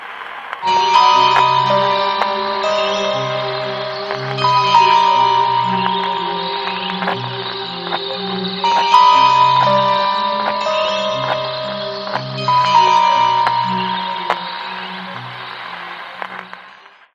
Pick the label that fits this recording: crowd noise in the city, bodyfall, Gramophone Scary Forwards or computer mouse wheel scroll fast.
Gramophone Scary Forwards